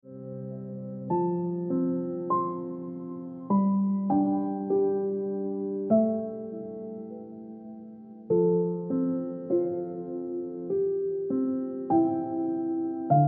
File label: Instant Ringtone Piano Music